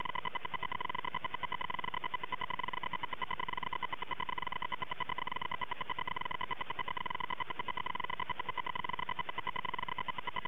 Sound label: LORAN